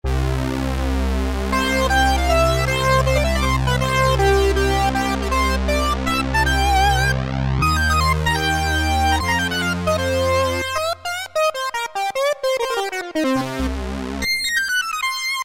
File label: Lead Synth, Roland Alpha Juno-2; Factory A